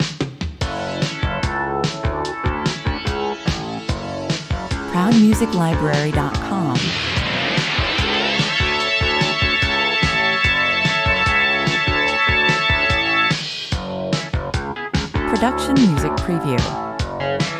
jump back 147 bpm, up-tempo, retro electro, 1980's synthpop track; infectious and fun, party time vibe; Jump back in time with this up-tempo, retro electro, 1980's synthpop track that has an infectious and fun, party time vibe